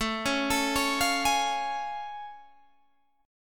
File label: A Augmented Major 7th A Guitar Open G